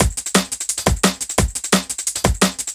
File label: DNB BREAK